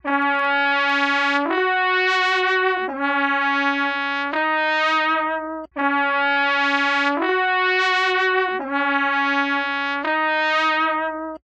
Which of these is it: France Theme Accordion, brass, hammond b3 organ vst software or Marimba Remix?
brass